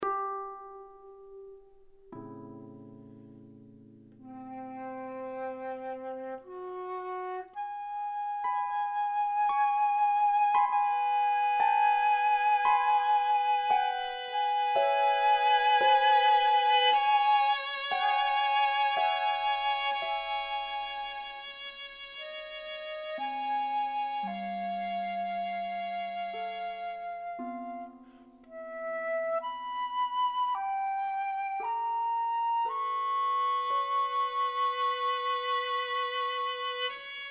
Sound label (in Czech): trio pro fletnu violu a harfu